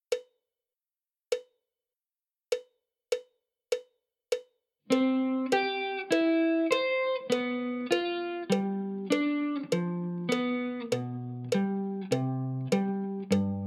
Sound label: position changing exercise, guitar